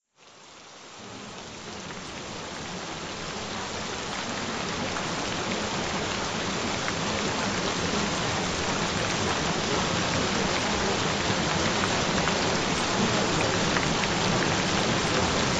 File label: Gentle Rain Sounds; Get Stunning Blue Eyes